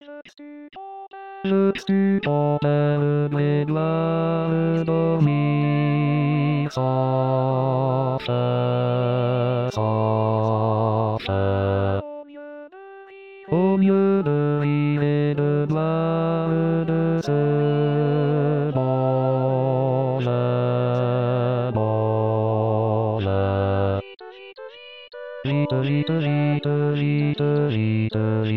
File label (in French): basse